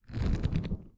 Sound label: Griping Clenching Fist